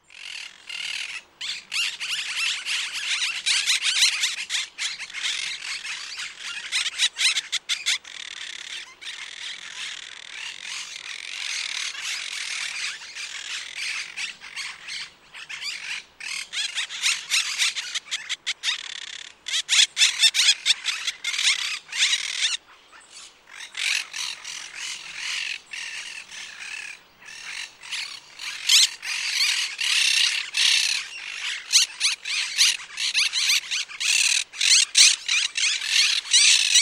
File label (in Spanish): cotorra argentina